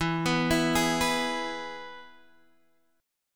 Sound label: E Major guitar chord, Drop D